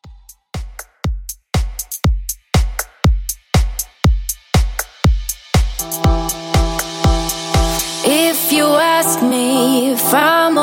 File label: Club Extended Mix 120Bpm